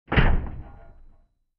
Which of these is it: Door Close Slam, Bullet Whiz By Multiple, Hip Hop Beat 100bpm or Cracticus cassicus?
Door Close Slam